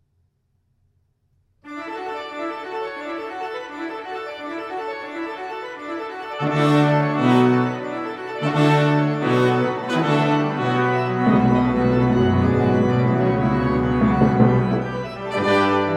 Suite For Organ, Brass Quintet, & Percussion - Toccata